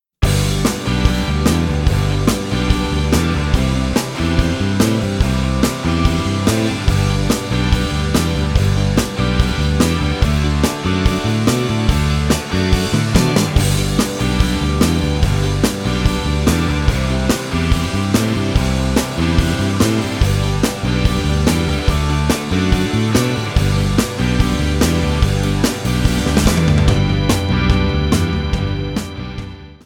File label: play the beat